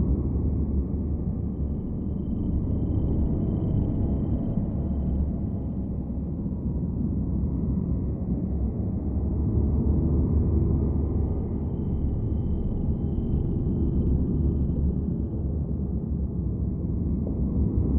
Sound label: ship transport ship idle; Stellaris; aquatics; ships